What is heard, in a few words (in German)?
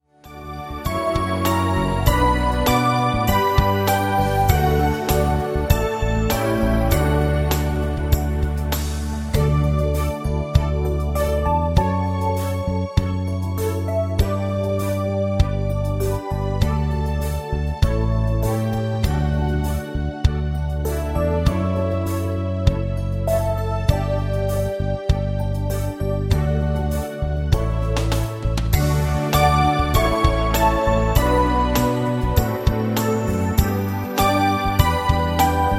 playback ohne floete; singt mit uns und stimmt mit ein